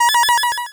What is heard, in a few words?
Cry